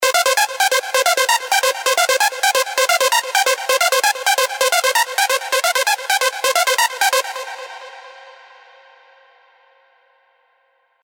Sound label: SQ, Sylenth1; Then Repeat; alonso 18 year anniversary pack